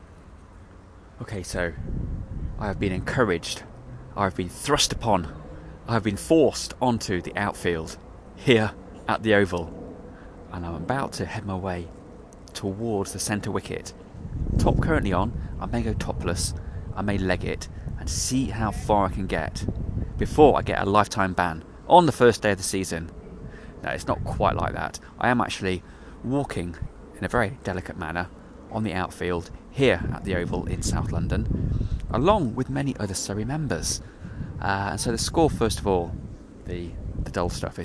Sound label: pitch invasion; surreycricket